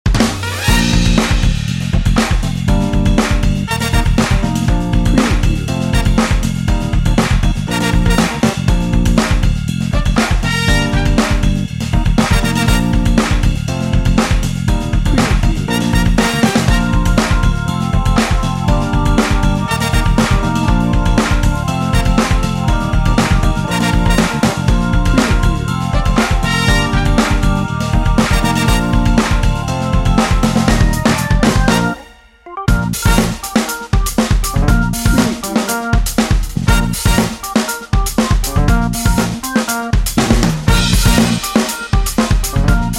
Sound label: happy feet no guitar